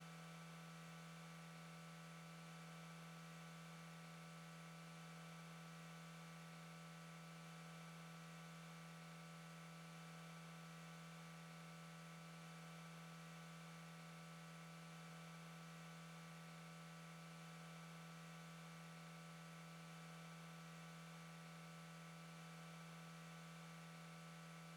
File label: car engine rave